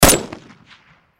Gun Rifle, 7.62 Caliber Dragunov Svd Bolt Action Single Shot; Rifle Sound Effects; Close Perspective; Blastwave; Warfare